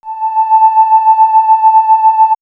Swirls Juno Voice, pads; X-Static Goldmine

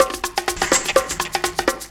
conga, Deep House, 125bpm

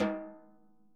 SNARE, Interactive Drum Kits

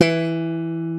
JS BASS; E-MU Formula 4000 Series Vol. 3; Analog Odyssey

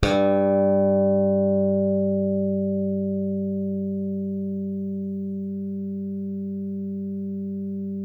SLAP BASS, Electric Basses

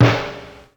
Snare, Ensoniq ASR-X Pro

